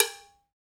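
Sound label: percussion cow, cowbells; Roland L-CD701; PRC Latin 1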